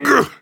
Orc Cleaver hit

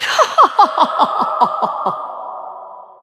laugh; Lissandra

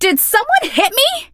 hurt vo

